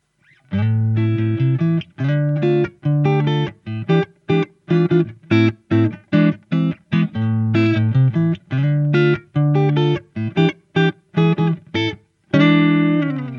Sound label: 60s Strat set jazzy vamp